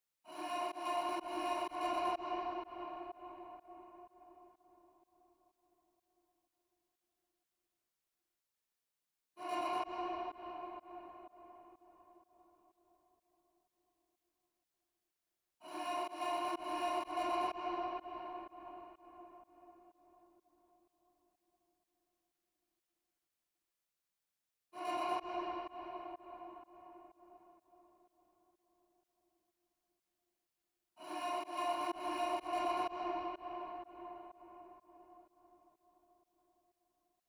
125 BPM A# Min Vocals; Melody Loops; Loop Stems; Cymatics; Antidote

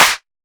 Clap, Techno; One Shots; GhostHack; Ghosthack - Free Techno Sounds 2024

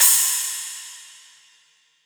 Crash, Cymbals; Cymatics; Dubstep Starter Pack; Drums - One Shots

